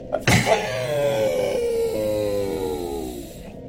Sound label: deflated